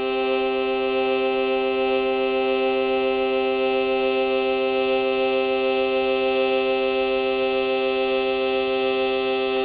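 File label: steady drone; goodnight jellybean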